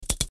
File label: click